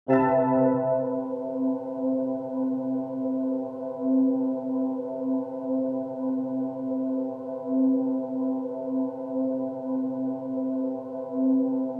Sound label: ambient